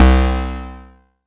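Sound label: mbrute synth, Arturia MiniBrute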